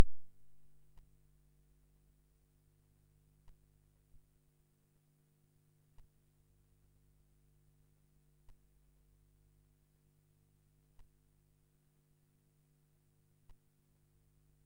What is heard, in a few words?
mosquito; MusicLine